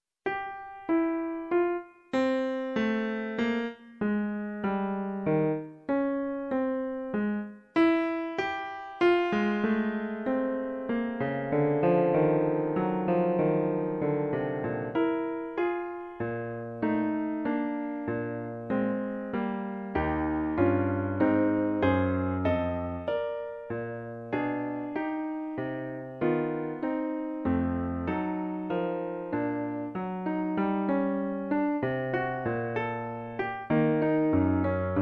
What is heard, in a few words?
clarinet sonata; brahms